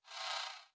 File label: title move away